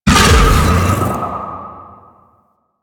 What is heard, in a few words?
Howl Attack; Warframe; Warframes; Voruna